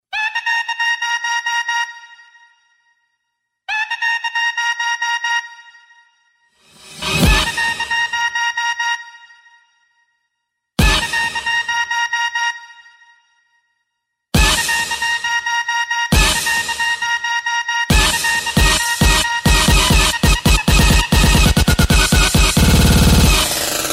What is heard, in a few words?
DJ